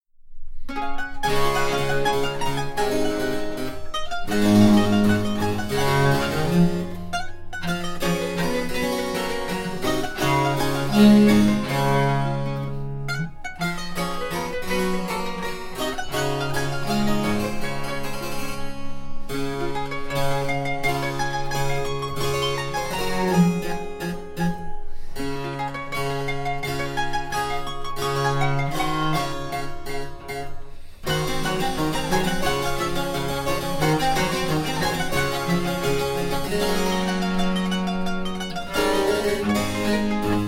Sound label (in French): SONATA Re Majeur Tempo Giusto